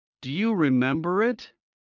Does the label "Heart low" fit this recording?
Yes